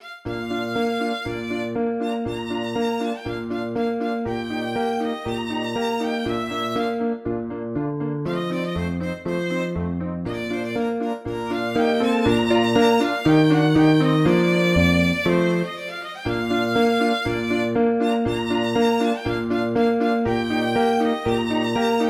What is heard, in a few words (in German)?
klarinettenkonzert allegro; hoffmeister franz anton; thema bearbeitung